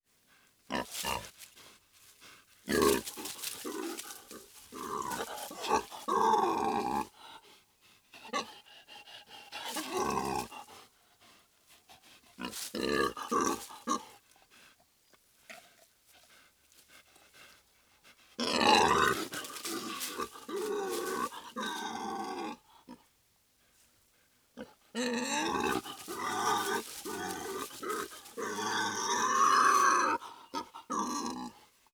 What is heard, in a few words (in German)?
Wildschwein Geraeusch; Wildtiere in Europa